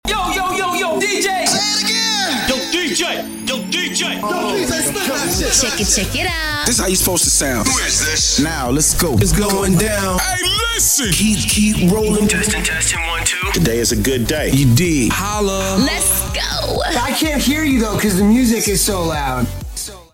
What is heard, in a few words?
Samples, dj sample pack